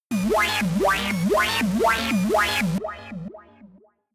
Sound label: shot futuristic wobble ringing low, digital; soundpool